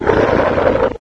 pit drone die; scmod; alienassassin

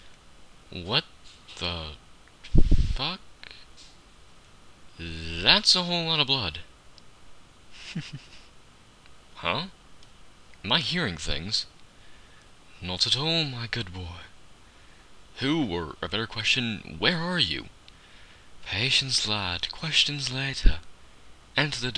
door

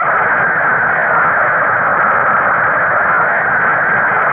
run, weapons; egon

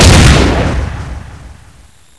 fire, weapons

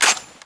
m1 en bloc in, weapons

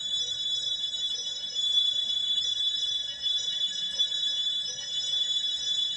amb crystal glimm; skillrun3

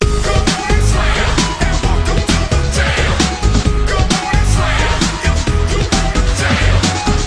song

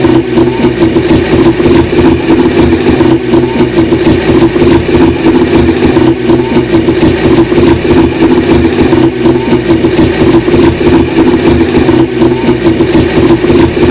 trabant; ambient

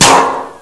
ric met, weapons

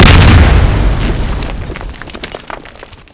explode; Explosions

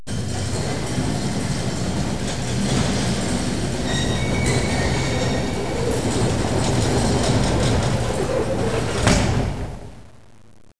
gate open close

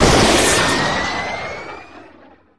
jetpack burst

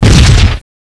boss boom

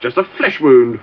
wound

